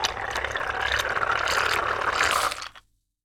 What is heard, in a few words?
Make Coffee